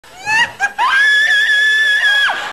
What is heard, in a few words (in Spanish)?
grito largo chiquito